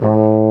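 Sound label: brass baritone A1; Roland - LA Composer vol. 1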